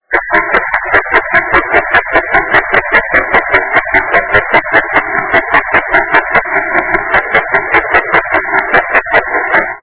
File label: nextel noise